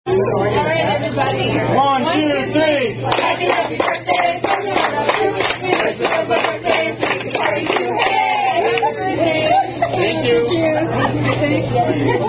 Happy Happy Birthday

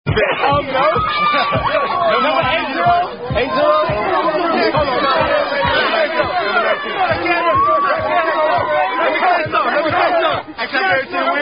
High School Football Chant; Birmingham

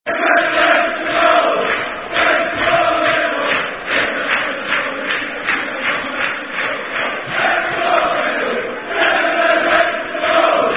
Ten Men Went To Mow; Celtic V Aberdeen, The Lock In

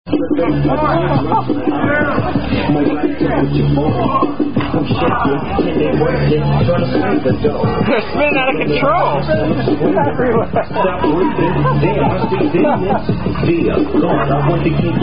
Dizzy Bat Relay, Dizzy Stick Drinking Game; Pitt Dizzy Bat Relay

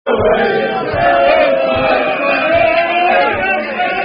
Unknown Football Chant

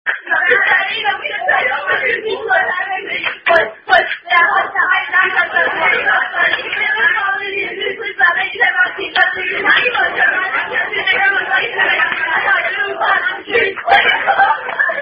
Apple On A Stick, Hand Clapping Game

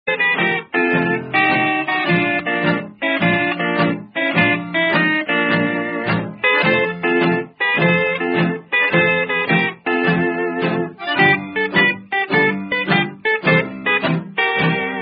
Schroder, 1941; american roadsongs

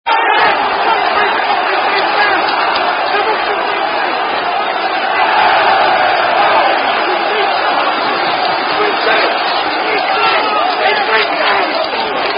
We Shall Not Be Moved Football Chant; Everton Vs United

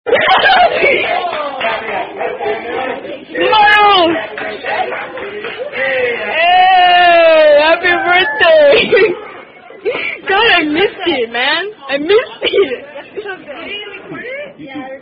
Happy Birthday, Happy Happy Birthday; Red Robin

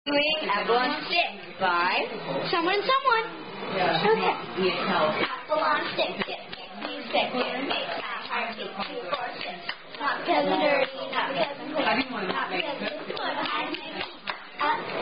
Hand-Clapping; Apples On A Stick (Hand-Clapping Game)

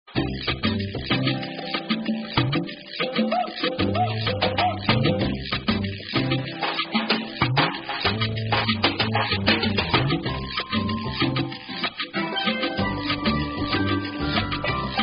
Karaoke Songs; Girl Scouts

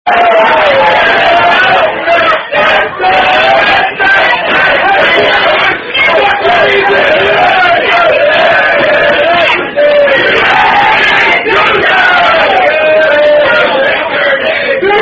Rugby Song

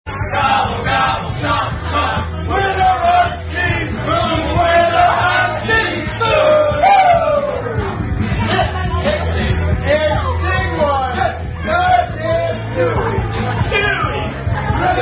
Fighter Pilots Singing, Several Songs

